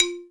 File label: BLOCK, LATIN PERC; Greytsounds VOL-1